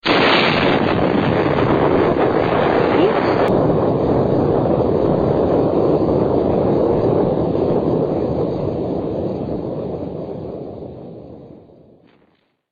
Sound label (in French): Bombe atomique, Explosions; Russe